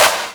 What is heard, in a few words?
Claps; Vengeance; Essential Clubsounds Vol.4